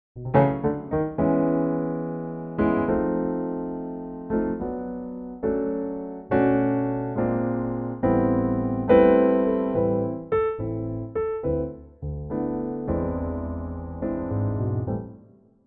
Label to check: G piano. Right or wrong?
Right